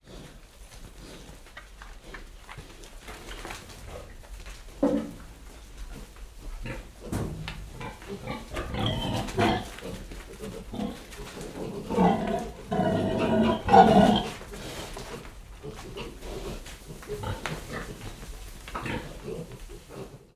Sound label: animals pigs mother and piglets eat inside barn